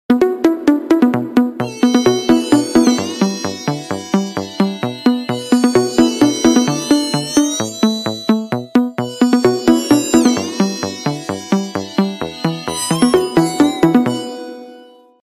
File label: samsung ringtone; planetarian